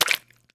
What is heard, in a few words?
Swim; SMB1 Sounds; CharaMario; Splatoon